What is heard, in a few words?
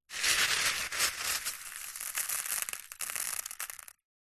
small stones pick up then sprinkle; foley